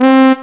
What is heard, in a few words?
trombone